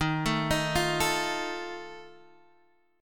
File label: Eb Suspended 2nd Flat 5th, Guitar, Half Step Down; chord